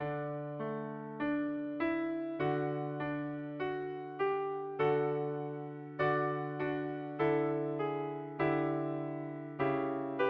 ave, piano

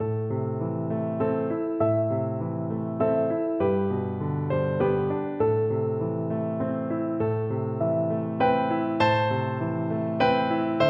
scarborough fair piano duet

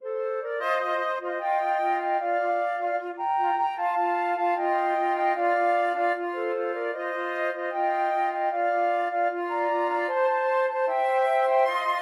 flute trio

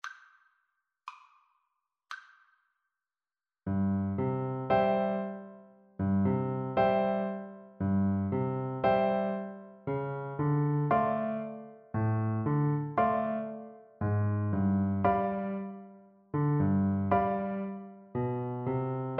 Bassoon

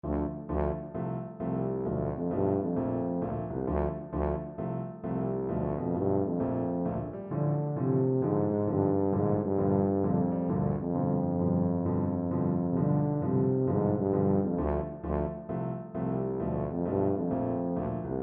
bob cat, tuba

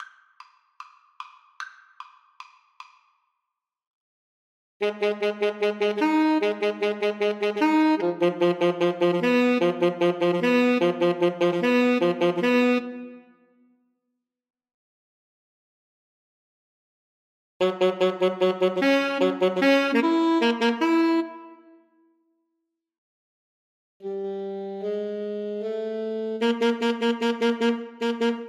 poules A SAX duet

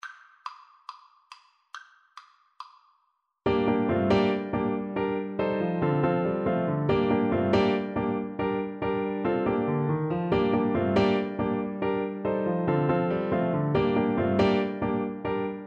rock, Clarinet

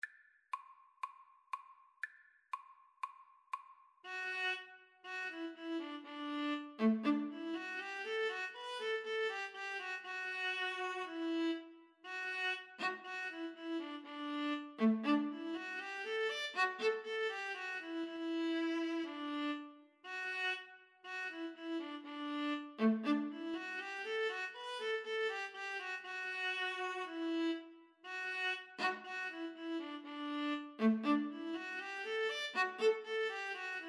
viola viola

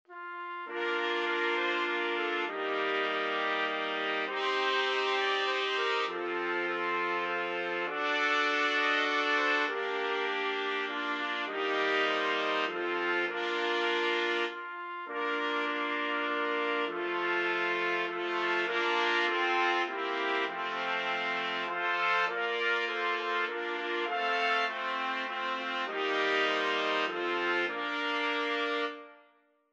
we wish you a merry christmas trumpet quartet

